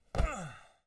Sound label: hit grunt; angband